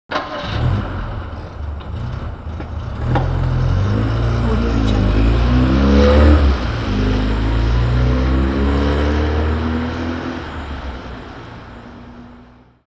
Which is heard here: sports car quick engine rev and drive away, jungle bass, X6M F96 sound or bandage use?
sports car quick engine rev and drive away